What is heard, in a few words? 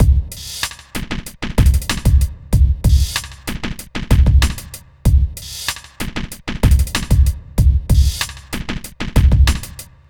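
Hip Hop Beat, right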